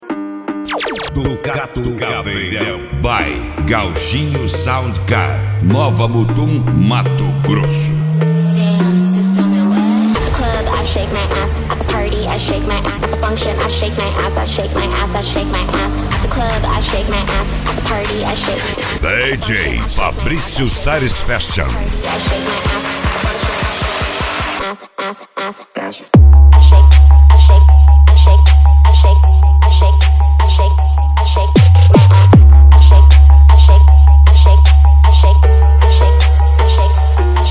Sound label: funk mega bass